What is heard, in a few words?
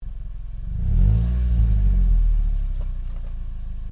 Car Toyota Corolla rev exhaust, hatchback; 2009 Toyota Corolla hatchback; Cars (Sound Effects:Vehicles)